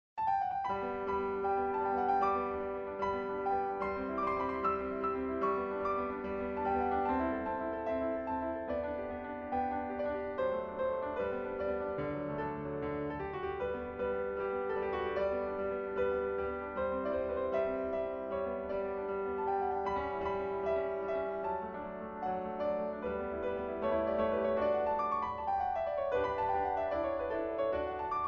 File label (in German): Clementi Sonatine G-Dur, Klavier; Muzio Clementi - Sonatine G-Dur